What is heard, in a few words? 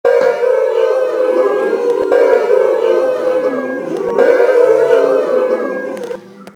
audience synth; DUISBERG